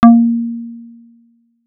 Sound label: m2 Rubber; gravity-force-lab-basics